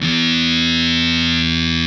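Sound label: fuzz, fuzz guitar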